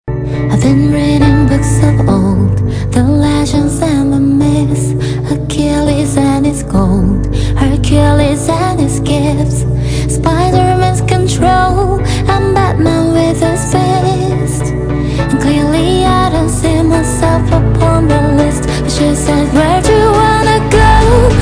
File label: Cover Giong Nu Cover